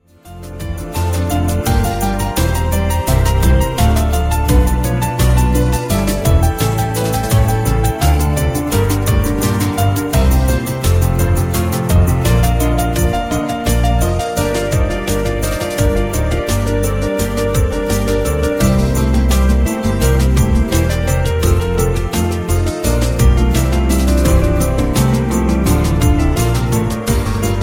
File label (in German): Inventio in F-Dur